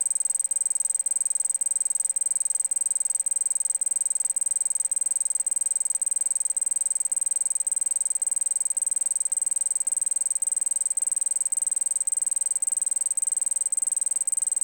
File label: Arming signal; Shopping cart; Rocateq